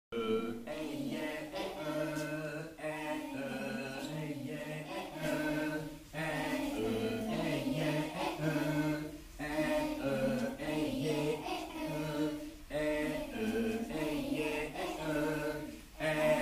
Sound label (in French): échauffement vocal pygmées